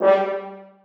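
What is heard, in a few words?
horns staccato g3; Sonatina Symphonic Orchestra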